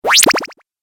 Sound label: 8 Bit Style Power Up; Video Game Sound Effects